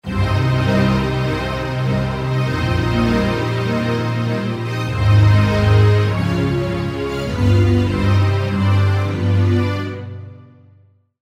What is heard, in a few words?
strings